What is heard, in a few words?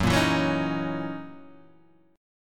Gb 13th Gb down Guitar Half Step Down